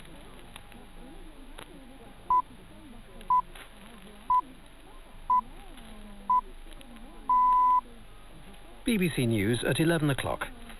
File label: BBC Radio 4 LW station 198 kHz